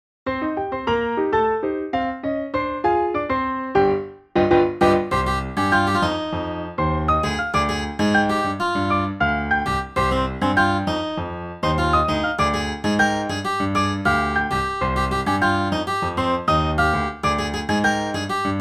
accomp melody

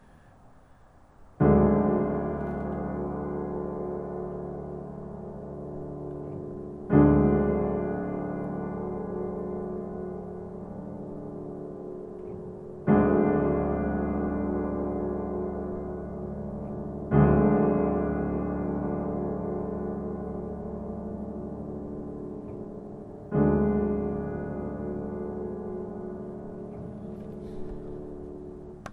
accompaniment

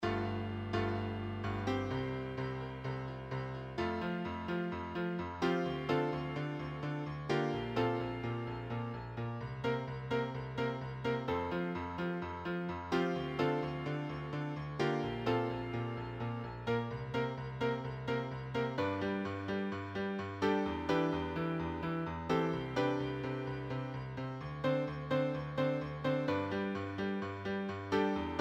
Female Callback Accomp

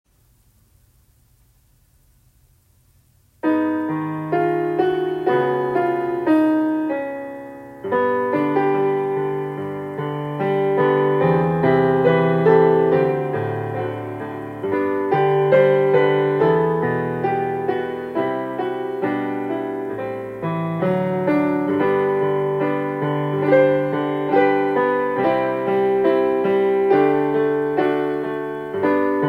accompaniment

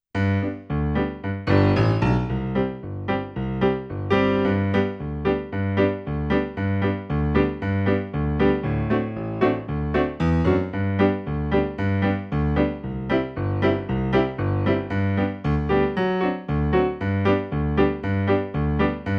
accompaniment

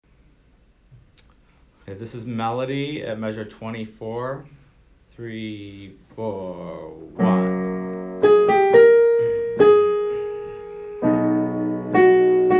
backups medley melody

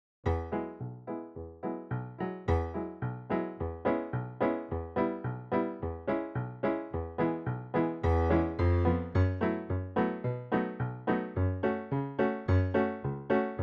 Backing

